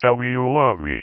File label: Female vocals and robo-vox